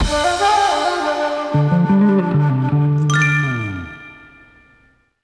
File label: Romance Pass Stinger; bully scholarship edition